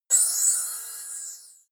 chiming alienation, impacts; hits explosionen

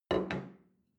plate place table soft; soundpool

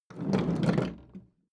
roll smooth; haus garten; wohnen

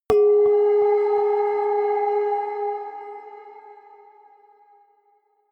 synth hit music box, hits impacts; whooshes rises

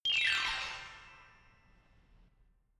notification glockenspiel horror slide down soft

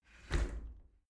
shower slide door close hit soft

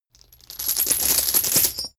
metal chain drop on ground

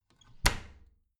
bathroom cabinet door creak close heavy

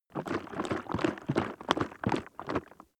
plastic liquid viscous shake; fluessigkeiten

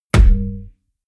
heavy bass saturated hollow, hits impacts

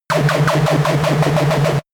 shot electronic; energie

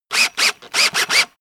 drill classic sequence short; bohrer; soundpool

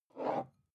glass pick up smooth; objekte

